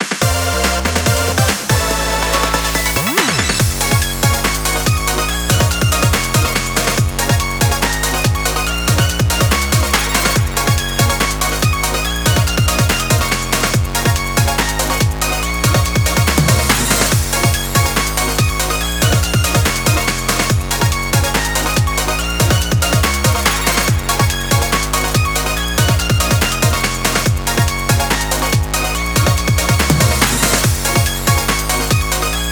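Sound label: Bgm Stage Clear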